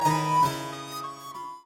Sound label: Fugue